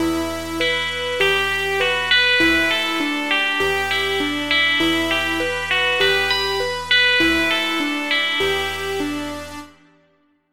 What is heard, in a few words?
dark intro a minor 7ths flattened leading tones dark but stable